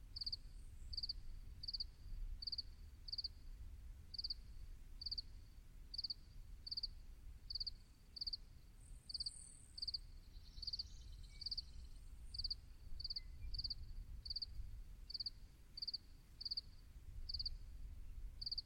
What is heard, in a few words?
cricket night; ambient